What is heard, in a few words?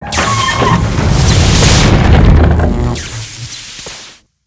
Door Open; Seer; GamigoNA